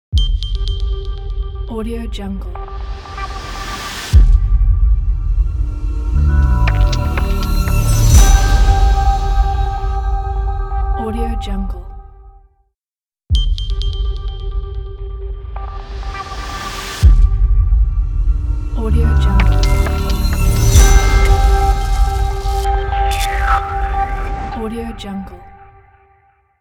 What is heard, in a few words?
hitech logo